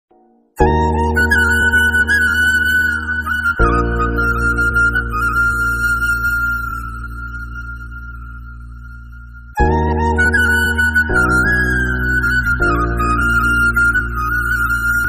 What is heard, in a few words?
calling tone bgm ringtone love ringtone instrumental ringtone phone ringtone sms tone